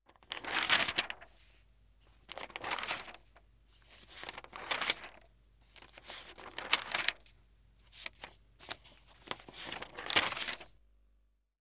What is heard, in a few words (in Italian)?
INCARTARE SFOGLIARE GIORNALE